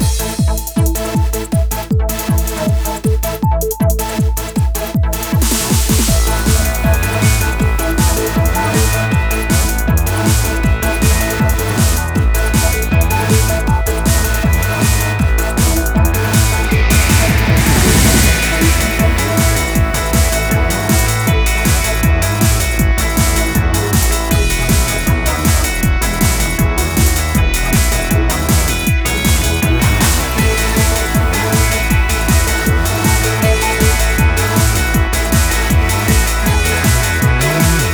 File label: bgm battle